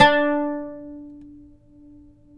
OUD D; Guitars; Spectrasonics; Hans Zimmer Guitars VOL-2

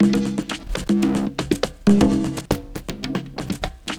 Conga, Percussion Loops

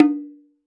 PAIGU; INIS - Opium; PERCS-KIT

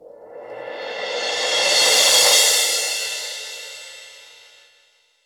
Crash Phrase, Roland BK-5 Cymbals

